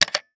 Technology Electronic Phone Press Flash Button Rotary Phone